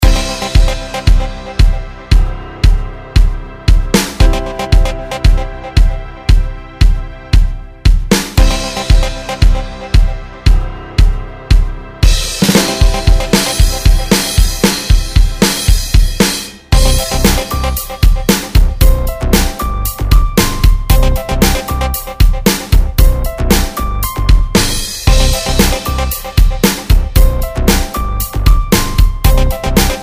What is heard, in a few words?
Instrumental; PacPac StudioS